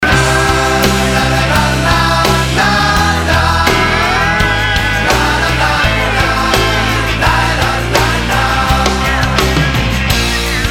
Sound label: Ringtone